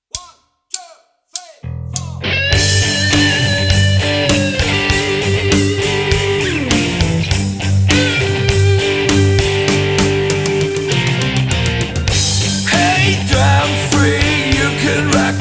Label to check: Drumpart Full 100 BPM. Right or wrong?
Right